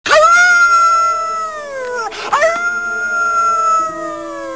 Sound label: howls